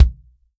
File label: Real Drum Kit, FUNK KIT; Best Service ProSamples vol.43